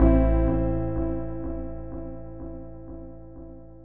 DEEPHOUSE ORGAN 125 A

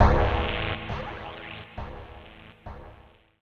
SPACE FX 140; SpaceSounds

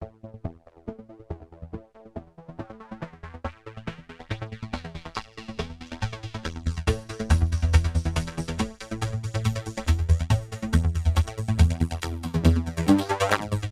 TEKNO 140; Techno2

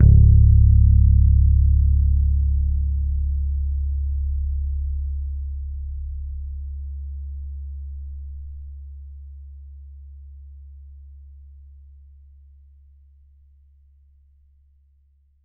T-BIRD BASS PICK C#1; Bass Mace; DirectWave

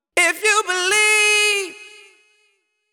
deep house male, 125, A, vocals

